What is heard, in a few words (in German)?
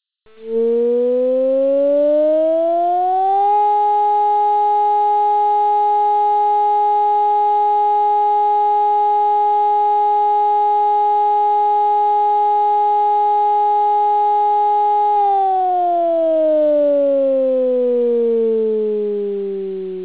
Probealarm, sirenen